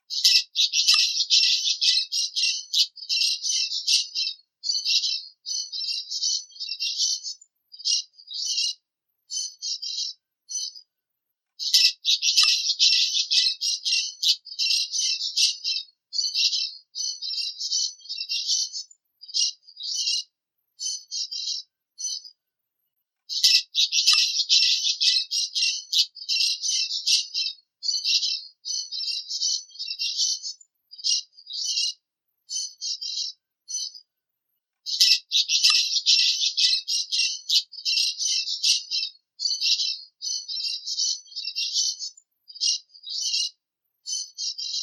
Canto do Periquito testinha

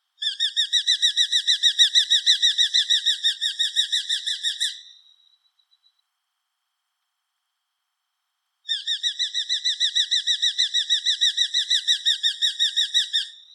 Canto do caure

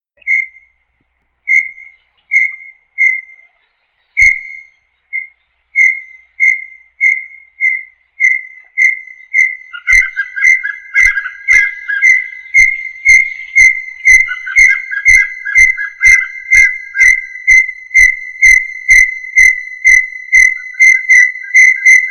Canto do Inhambu Guacu

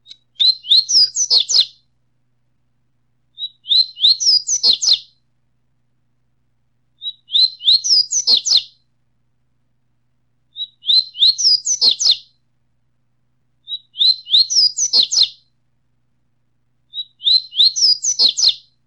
Canto de Coleiro Tui Tui Puro

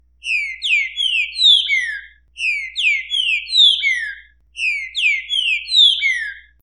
Canto Trinca Ferro; Eu Sou Terrivel

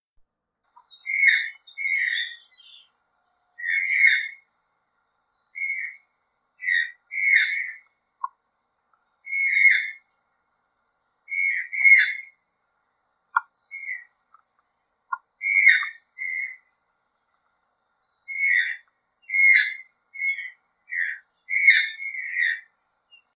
Canto do Tucano de Bico Preto

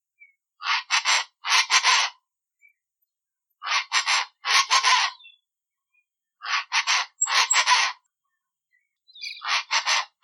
Canto do aracua de asas azuis

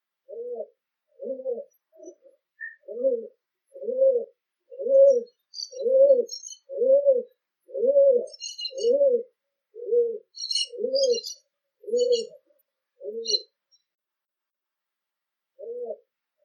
Canto do pombo domestico

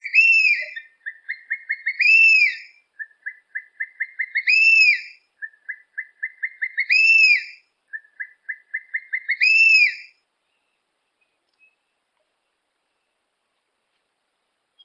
Canto do Gaviao pega macaco

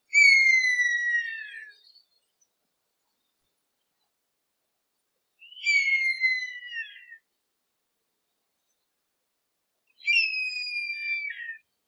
Canto do Gaviao caboclo

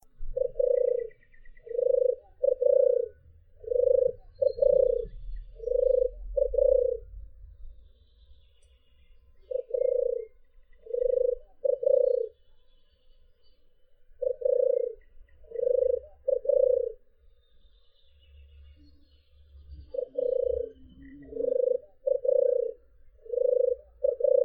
Canto da rola comum

